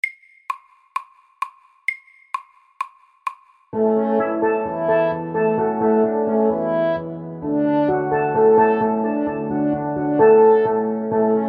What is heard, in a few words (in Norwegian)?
Steel drum band FORT